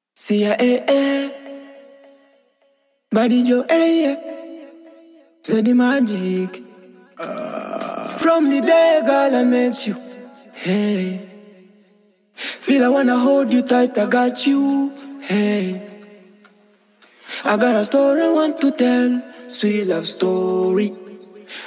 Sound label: Acapella Vocals